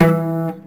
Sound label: Cello Hit String Snap; SFX Kit; Foley - Misc